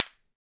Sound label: Cana, Percussion; Roland BK-5